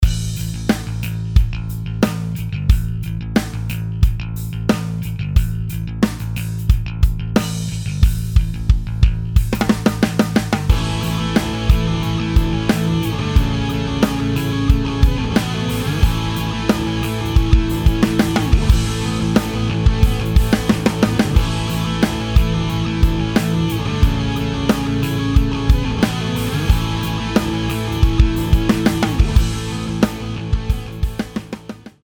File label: Premier Birch